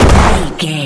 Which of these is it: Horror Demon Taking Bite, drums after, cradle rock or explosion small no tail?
explosion small no tail